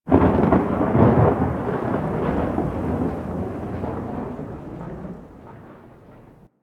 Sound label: Hub Cephalon Suda Rand Thunder; Warframe; Ambience; Tenno; Syndicate Cephalon Suda